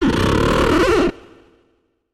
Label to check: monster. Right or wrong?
Right